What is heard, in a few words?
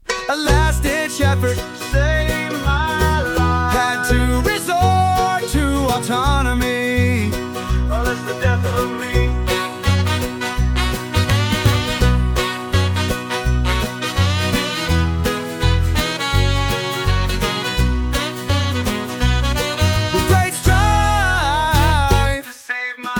Unplugged